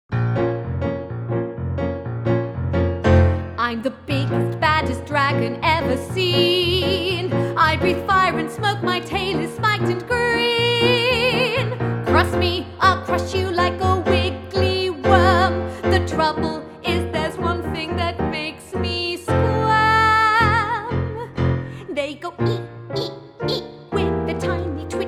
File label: w vox